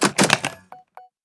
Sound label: briefcase open success; Counter Strike Model Pack; CS:GO; survival